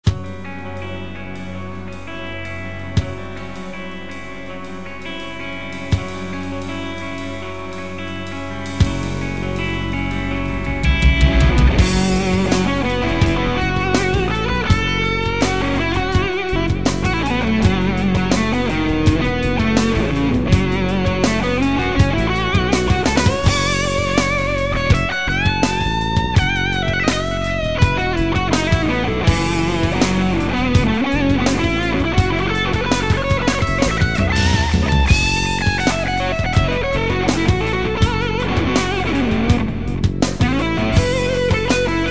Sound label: drive jam